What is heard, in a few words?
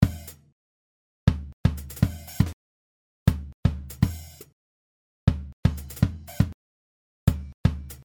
bd comp; コンプレッサー